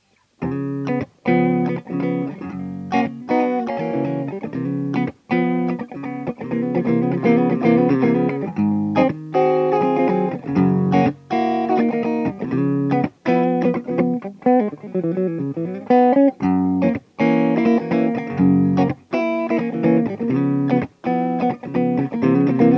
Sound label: les paul hard bop